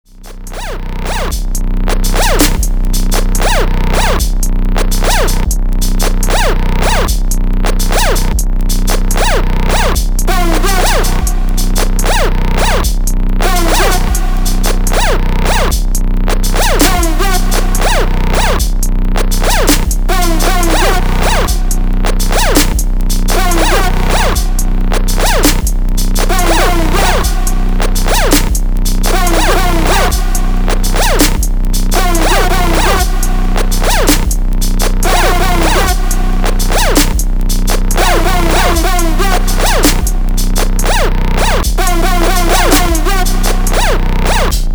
Trap

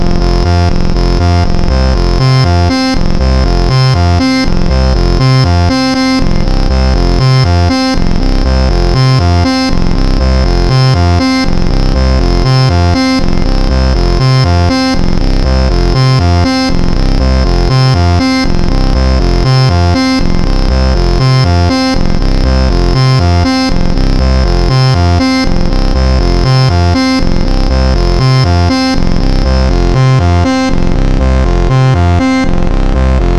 dark trance stream

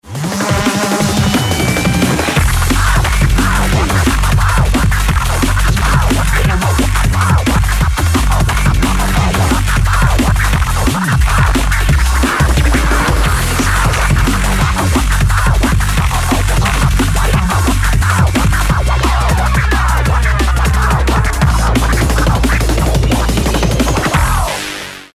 Neurofunk Scratch

